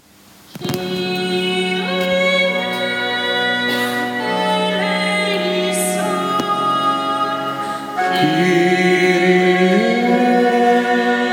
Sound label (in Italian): Kyrie BASSI; Lourdes